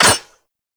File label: Auto Crossbow Mech; Warframe; Weapons; Tenno